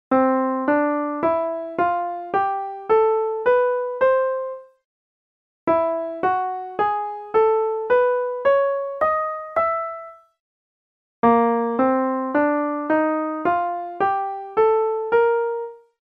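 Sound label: transposed major scales; scale mode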